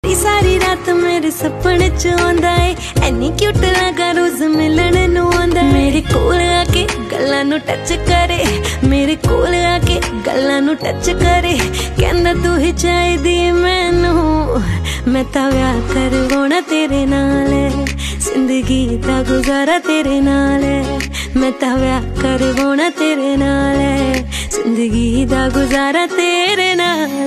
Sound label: panjabi ringtone